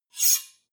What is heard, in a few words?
two knives large scrape fast hard